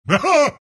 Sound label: alch laugh; dota; alchemist